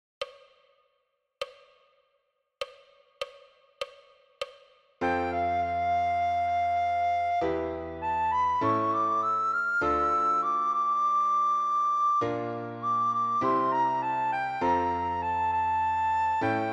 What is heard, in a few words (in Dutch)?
sopraan